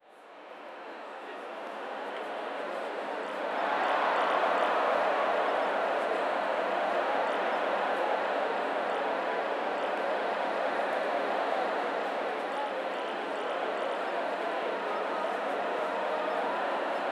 a lively stadium filled w

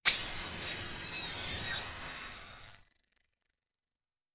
long thin web, string; spiderman

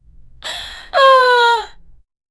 girl grunting oof after f, fall

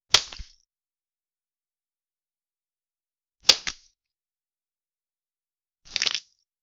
picking up an item

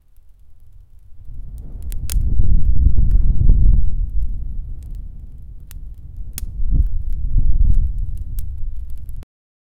soft fire crackles with g

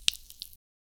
hand picking mushroom fro; organic

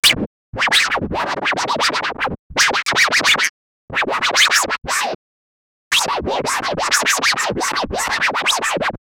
dj scratch; sound effect

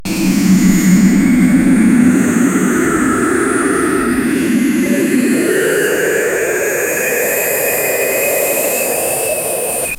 enemy battleship spotted, meme

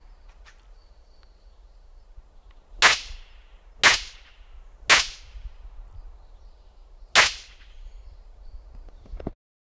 beast chasing humans

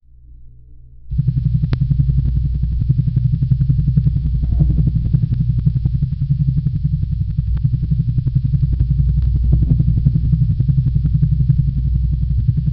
deeper into the nightmare, bass drop

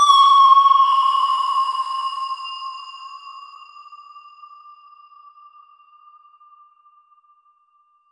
short 05 second ui sound

